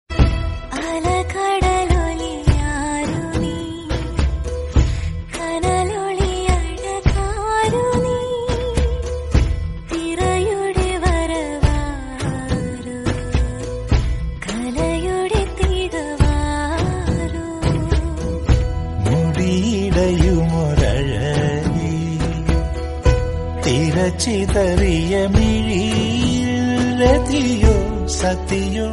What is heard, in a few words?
malayalam ringtone bgm; urumi; prithviraj movie